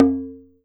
TUBANO

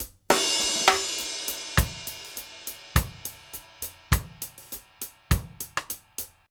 HELLO FILL; AMG Gota Yashiki Groove Activator

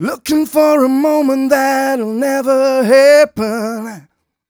m 127 mel a, Vocals

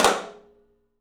13 TOM, 3D Drums; 3D Sound; MUTD 08 TOM

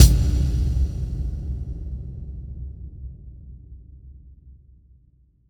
phreaky kik; Kicks

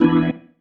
Stab One Shots, Organ

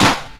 FING CLPS, finger

